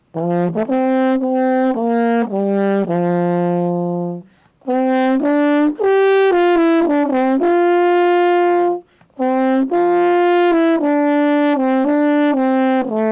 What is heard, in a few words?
euphonium baritone; western